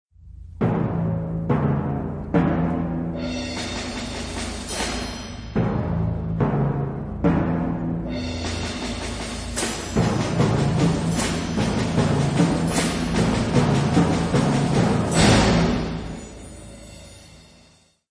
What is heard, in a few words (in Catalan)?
secció percussió